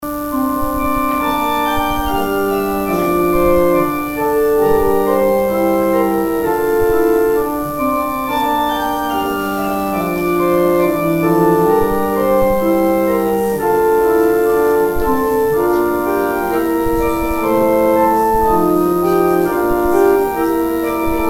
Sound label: organ interlude; Offering organ interlude